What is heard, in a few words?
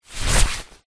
raincoat arm slide out of sleeve; foley